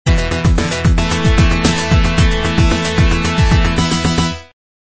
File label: Game Over; Yuzo Koshiro